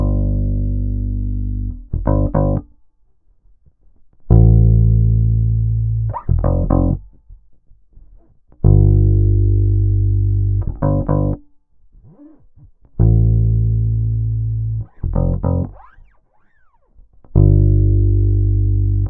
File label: BASS